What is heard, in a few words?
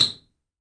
TOY HAM., TOYS PERCUSSION